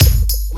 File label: kick, drums; mercure drums